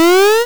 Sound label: jump